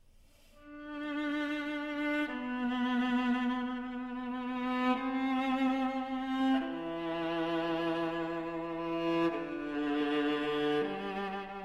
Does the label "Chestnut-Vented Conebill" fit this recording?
No